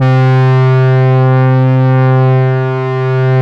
REED, E-MU Producer Series Vol. 6 – Analogia Project, Strictly Synthe, Smoov Leadz